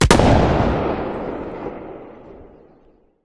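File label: sawedoff distant, shotgun; arccw go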